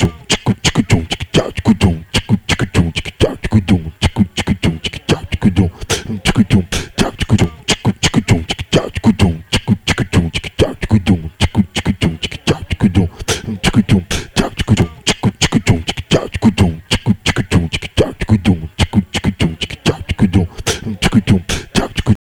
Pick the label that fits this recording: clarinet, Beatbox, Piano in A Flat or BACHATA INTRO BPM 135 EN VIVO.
Beatbox